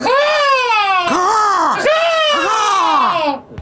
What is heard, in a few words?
Ca caw ca caw